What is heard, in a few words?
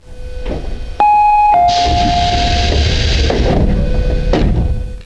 8000 oneman door open; car